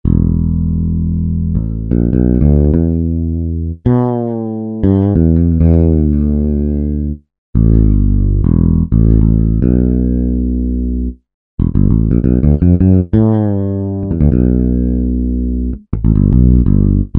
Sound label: Fretless Electric Bass; Apple GarageBand 2